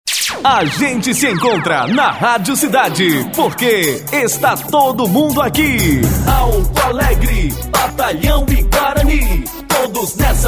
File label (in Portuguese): Vinhetão Cantado; Cidade AM